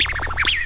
scratch beep; Urban Shakedown

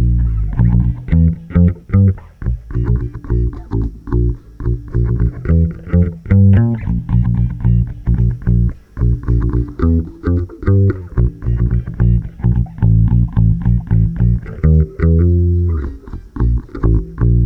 WORLD 110, Bass Grooves; USB Soundscan vol.30; AKAI

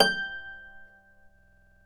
Celtic Harp

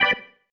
Stab One Shots, Organ

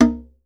EARTH TN, EARTH DRUMS; Voice Crystal - Steve Reid Definitive Percussion

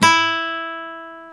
GTR Nylon, Nylon Martin

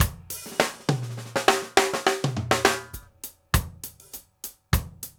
HELLO FILL; AMG Gota Yashiki Groove Activator

